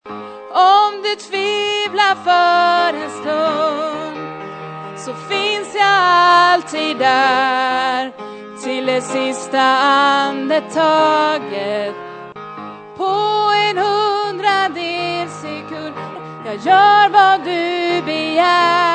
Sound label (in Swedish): alt, stammor